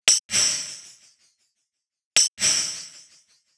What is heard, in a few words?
115 Perc Gated Tambourine; Zero-G; Future Wave